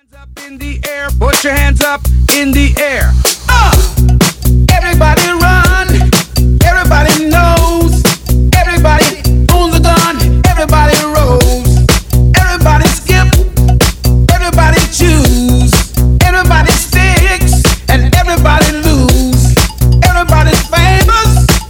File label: Mashup 125bpm; Reworkz